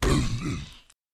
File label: zombie hit